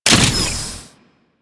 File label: Arch Rocket Crossbow Reload, Weapons; Warframe; Archwing